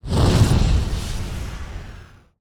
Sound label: furnace burst